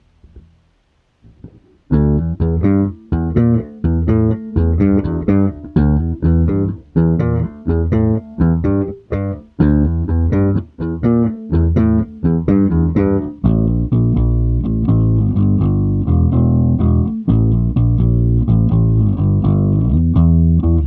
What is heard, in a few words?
surge bass